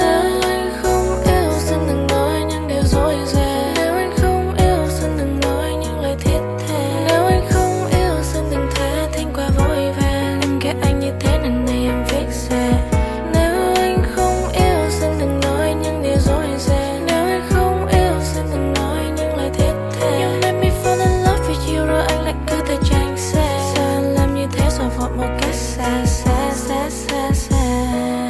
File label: Piano